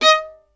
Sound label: STR VLN, Solo Instruments; Vln Misc; Miroslav Vitous